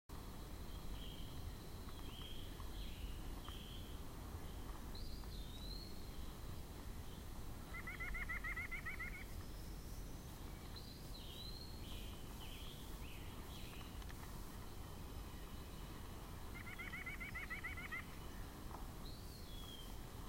White-breasted Nuthatch, birds; Indian Springs; The Birds of Indian Springs; audio cassette tape

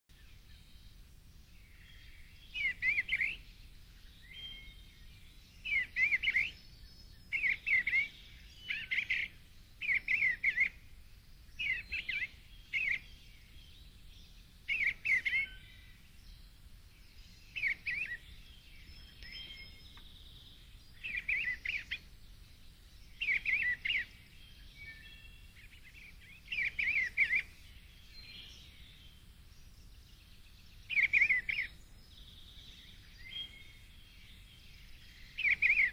Eastern Bluebird, birds; Indian Springs; The Birds of Indian Springs; audio cassette tape

